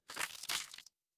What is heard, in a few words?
cartoon splat